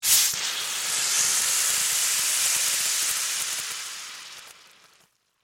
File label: Soda Bottle Open, Spray, Fizz; Foley; Fizzes (Sound Effects:Objects); BlastWave